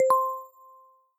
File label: chat message